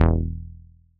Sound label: funky disco bass pluck one shot